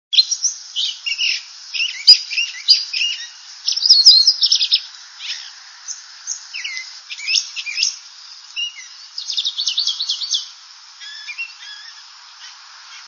thrasher brown, birdsongs

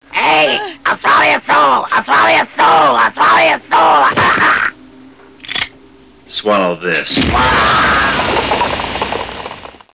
Swallow

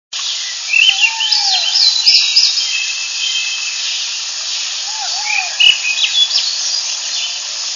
thrush swainsons; birdsongs

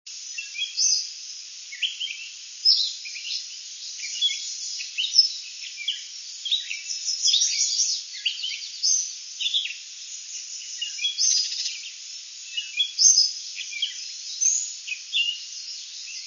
catbird imitating tanager; birdsongs

